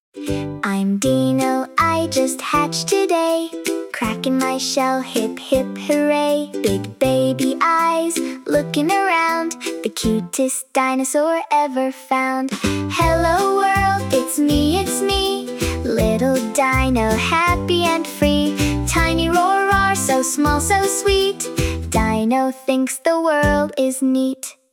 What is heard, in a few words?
hip hip hooray